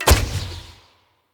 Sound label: Hunter Bow Ranged Shot Charged Variant; Weapons; Player; Envoy; Bows